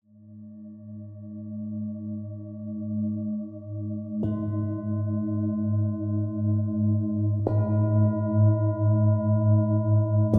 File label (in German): Sakralchakra syn. Mond, Klangschalen; Klangschalen-Chakra-Meditation